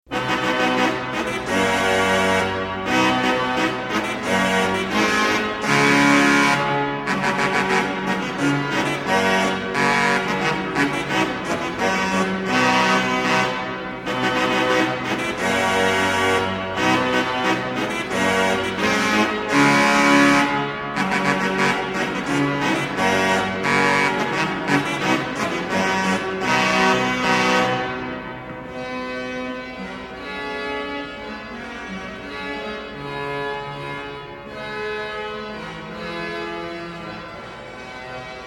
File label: organ, historical organs; salamanca; praetorius